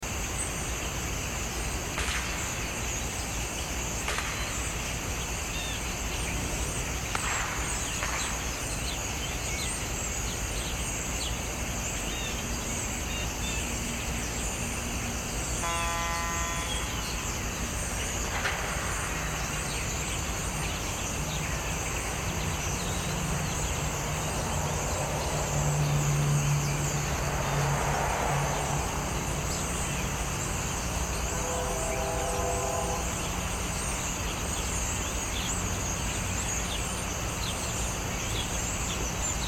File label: Ambient; Flippin Kaktus